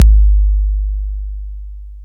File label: TR-808 Kick